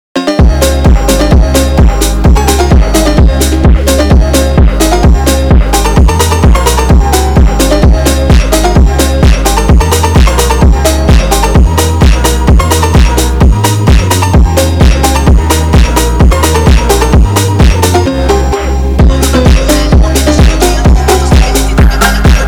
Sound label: Phonk; Angry Birds